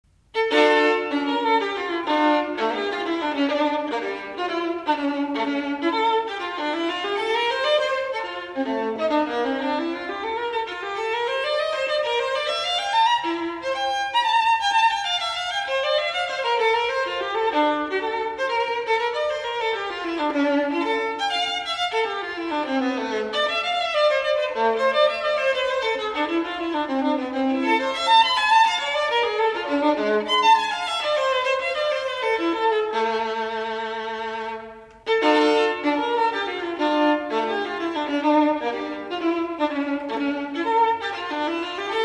Corrente; Bach

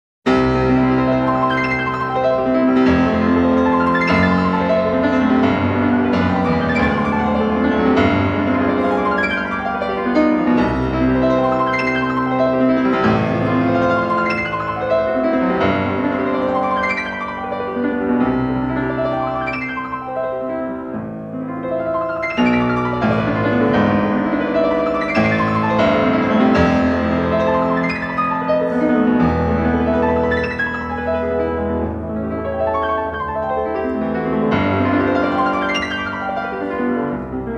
Etude In C Major Op.10 No.1; Chopin

